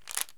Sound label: pillsbottle draw; Escape from Tarkov